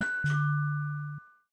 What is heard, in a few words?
Retro Doorbell; Samsung Galaxy Z Fold 7; SoundTheme Retro